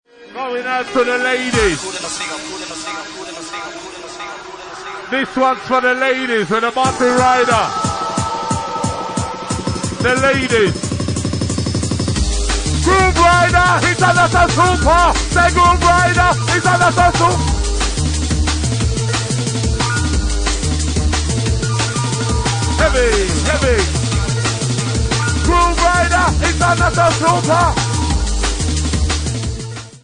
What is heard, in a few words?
DNB